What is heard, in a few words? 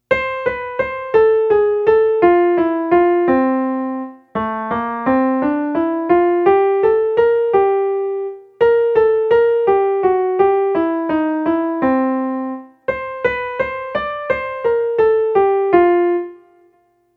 Mexican Hat Dance